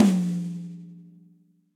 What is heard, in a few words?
Tom High Session Dry, Drum Hits; Standard Hits; Ableton; Factory Packs; Session Drums Studio